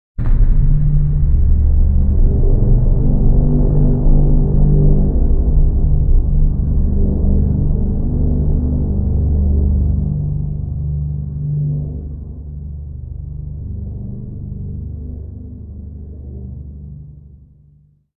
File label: Boom Drone; Epic Tools vol. 1